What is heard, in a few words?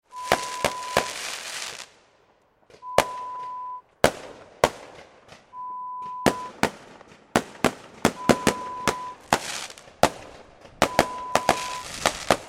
Firework sounds